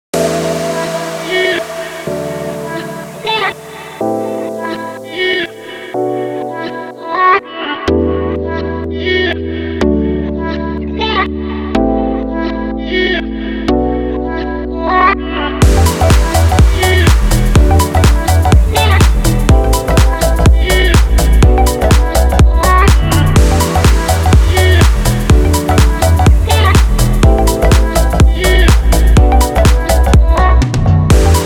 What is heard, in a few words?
fullmix, house electronic techno; By Burak Sound Bank; house electronic techno vol 8